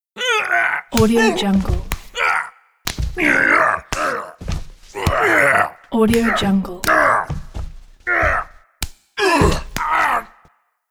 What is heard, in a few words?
wrestling